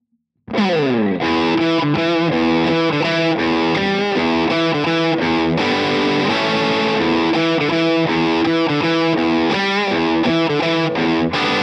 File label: characteristic sound; jensentone